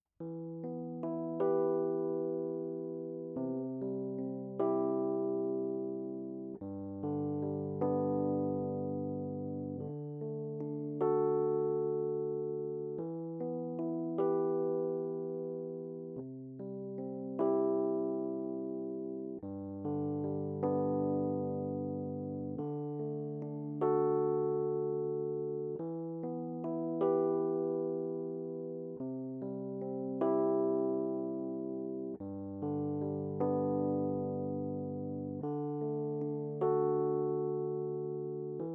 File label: rhodes